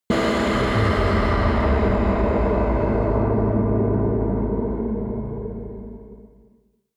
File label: air blast